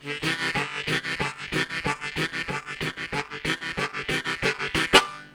Sudden End Bass, Harmonica; Zero G

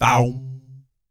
BS, Vocal BASS FX

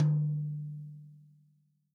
TOM, Real Drum Kit, POP KIT

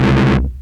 GROWL DROP; PlatinumPhattCD2; E-MU Producer Series Vol. 8 – Platinum Phatt

